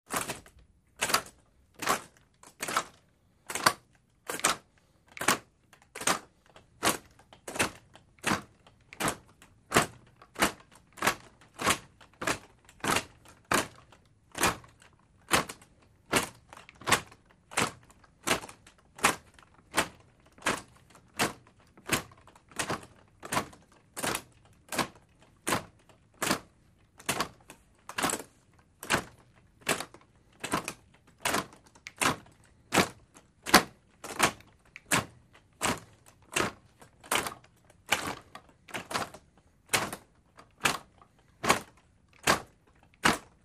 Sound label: Footsteps Group Sol